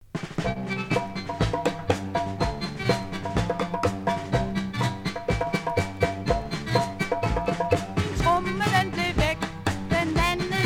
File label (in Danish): tromme; Min tromme